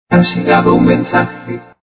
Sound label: spanish futurist ringtone